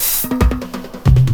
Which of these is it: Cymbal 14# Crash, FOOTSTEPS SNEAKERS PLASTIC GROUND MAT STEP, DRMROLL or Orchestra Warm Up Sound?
DRMROLL